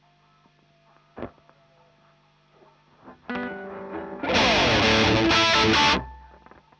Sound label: Marshal Gtr